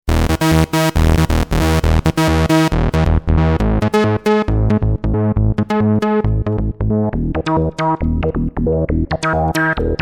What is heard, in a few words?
Lancet filter